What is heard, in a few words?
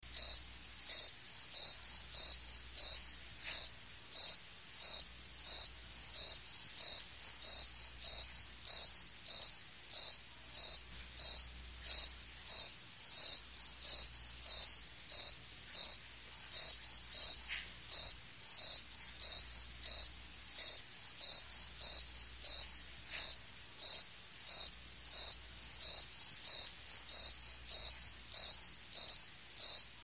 insects cricket single and steady